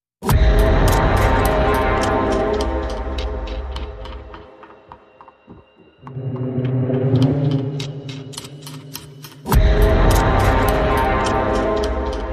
Horror

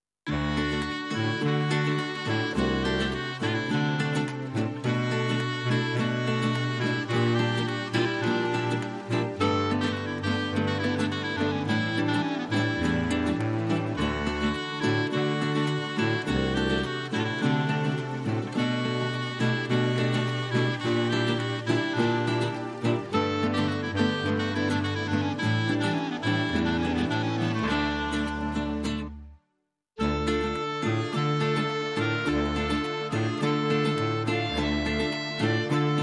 Sicily, Clarinetto Version

